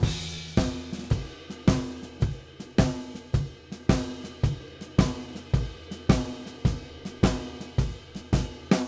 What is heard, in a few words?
Drums Intro, Blues; Digidesign; Pro Tools; Pro Tools Essential Demos; Essential Loop Demos; Demo Session